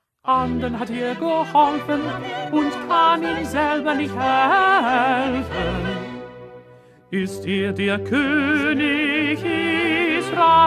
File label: 2nd Tenor; Bach-St-Matthew-Passion-67b